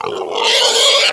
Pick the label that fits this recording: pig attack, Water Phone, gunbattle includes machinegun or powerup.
pig attack